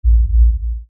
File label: Lfe Pulse Low Bass; Drone; Blastwave